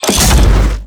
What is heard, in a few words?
reload; weapons; cow mangler; tf2